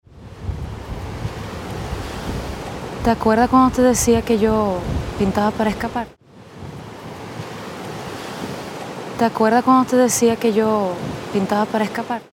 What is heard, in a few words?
Wind